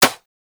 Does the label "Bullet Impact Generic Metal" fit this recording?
Yes